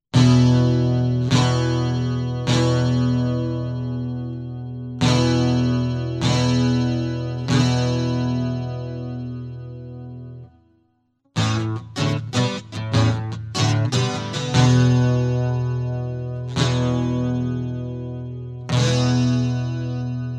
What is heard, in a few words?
Hammer On E Prelude